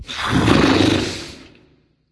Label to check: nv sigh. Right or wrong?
Right